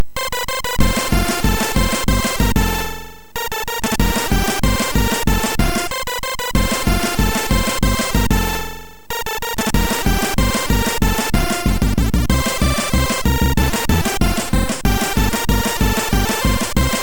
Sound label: Polka sid MOS6581R4